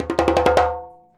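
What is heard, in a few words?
100 JEMB, djembe; Univers Sons; Basicussions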